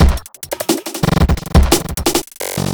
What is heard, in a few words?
Glitched Beat 175BPM; glitch kit